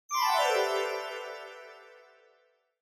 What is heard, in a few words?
Scene Change Low Down Fast